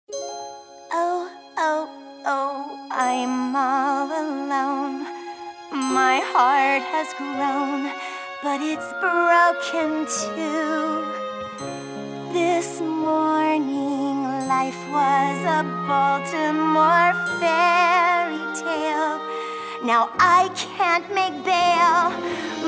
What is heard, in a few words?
baltimore reprise vocals